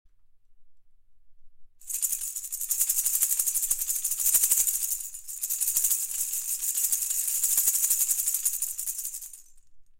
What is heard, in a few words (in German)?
Astgabelrassel